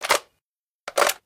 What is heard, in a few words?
reload